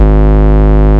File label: Bass, Root G#; Vengeance Samples